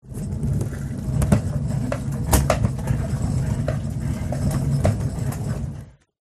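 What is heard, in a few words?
Rolling Janitor Cart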